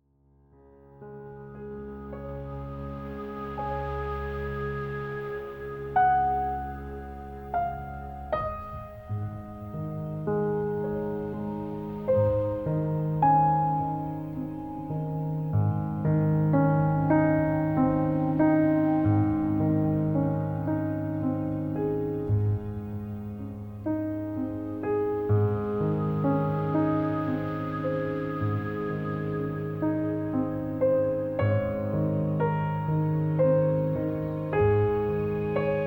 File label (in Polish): Zywiol Powietrze Instrumental